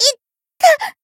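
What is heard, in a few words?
damaged, Japanese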